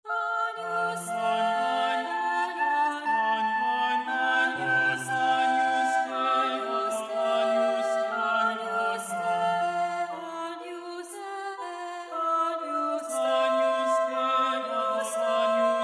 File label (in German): Dreistimmig Funfte Gattung